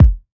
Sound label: Kick